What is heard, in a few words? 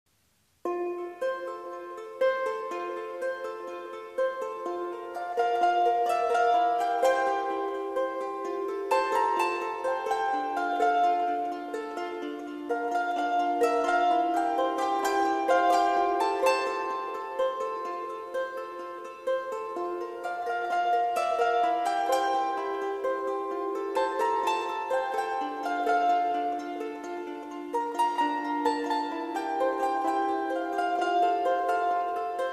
lap harp